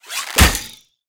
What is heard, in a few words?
Fight Attack Bow; Mighty Party; Android